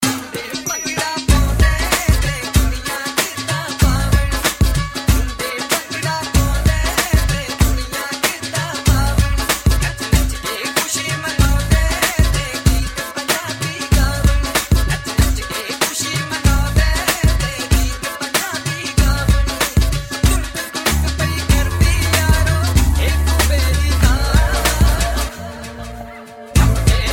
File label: Dhol; UK Punjabi